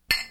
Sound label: glass hit